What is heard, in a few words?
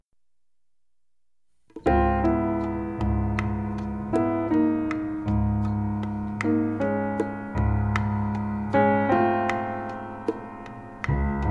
jazz orchestra interlude